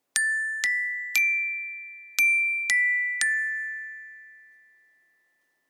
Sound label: Wooden Club